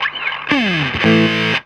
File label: GTR; USB Soundscan vol.32; Roots Disco; AKAI